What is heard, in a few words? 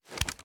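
inspect, shotgun; gmod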